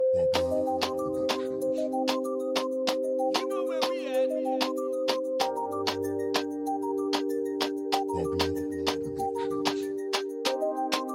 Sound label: Latin Reggaeton